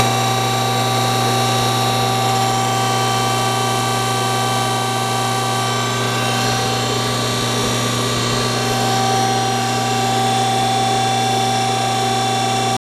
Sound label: hyd pump, lathe; CutKing; Gang Lathe